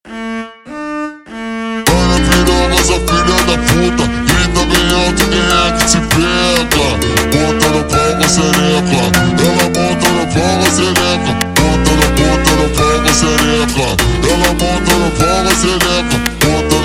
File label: brega violino ultra slowed